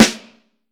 Vintage Snare Drum; 3.5X14; Q Up Arts; Johnny.C's Vintage Snare Drum

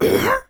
Mouth Fx, Vocal

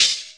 CHH, Dance Drums

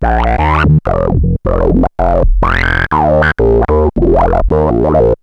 VOCODIZER; Syntec; Wall of Sounds; TECHNO-BOX

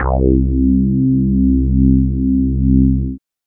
syn, Techno; single-sounds; single-synth; Techno ID

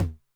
Noise Low, 909, MID TOM

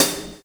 Deviate HiHat; Sonic Foundry (Sony Creative Software); Drums & Drones; Construction Kits

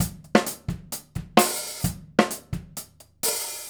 Straight Kit, World Pop Beats

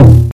TOM, Drums Dance & Groove; USB Soundscan vol.11; AKAI

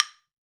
Clave Lo, Perc; Akai MPC-500

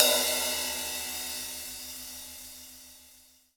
crash, Crash Cymbal, mono crash; AKAI S6000 CD-ROM - Volume 3

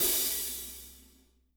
hat, warm ballad; Spectrasonics; Burning Grooves CD2

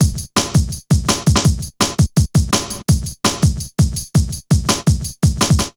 Hard Stepz B, Drum Loops 166.5; Zero-G Jungle Warfare 1, 2 & 3

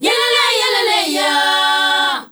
ZULU CHR, FEM. CHOIR

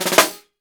snare drum, jungle snare chromatic

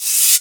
rev cymbal; Ueberschall; Techno Trance Essentials; DRUMS

